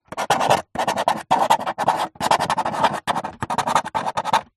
foley small spike scrape scratch write on thin plastic; zapsplat